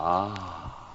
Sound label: "ah", relief